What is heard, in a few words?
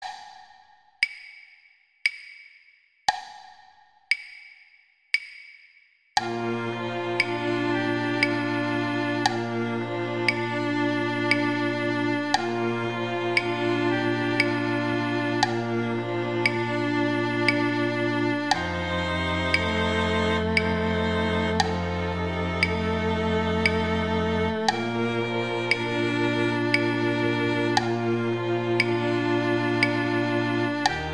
Violin II; String; Gruber; Silent Night